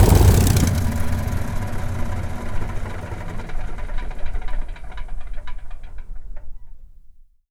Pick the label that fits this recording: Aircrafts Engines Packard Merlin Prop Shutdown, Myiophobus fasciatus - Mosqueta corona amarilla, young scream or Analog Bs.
Aircrafts Engines Packard Merlin Prop Shutdown